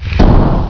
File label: DOOR OPEN; PowerPete Demo; Jurassic